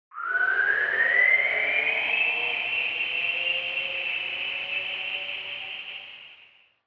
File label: Retro Anime Mech Equip